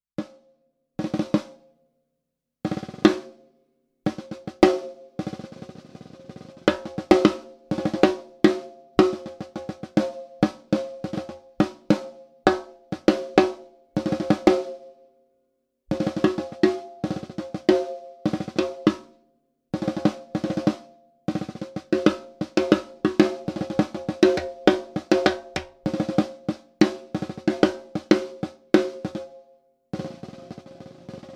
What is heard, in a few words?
Steinberg UR12 AT4040 Snare